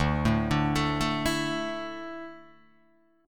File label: D Suspended 2nd D down Guitar Open D